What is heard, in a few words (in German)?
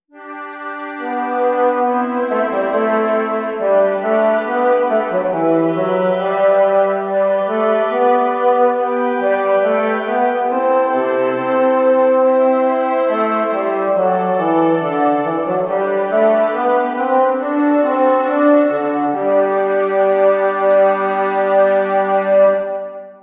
Stimmiger Contrapunkt; 5 Gattung; Ronge